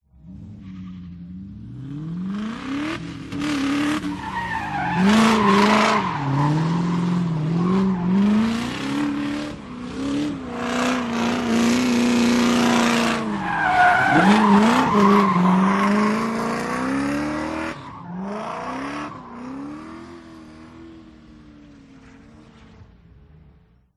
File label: drift car launching fast maneuvering with screeching tires; Sound Ex Machina